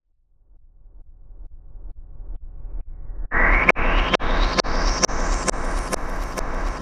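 Reverse Controlled Filter, Cinematic Metal Impacts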